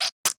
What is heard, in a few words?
flop; toxifin